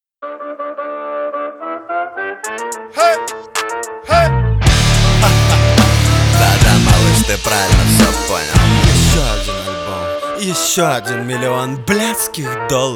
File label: rock remix